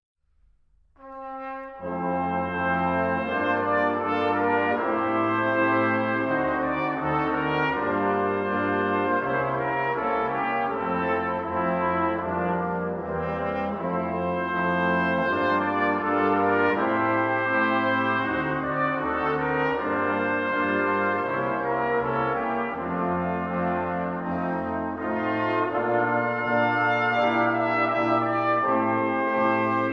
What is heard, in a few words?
brass serenade